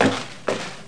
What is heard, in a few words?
Step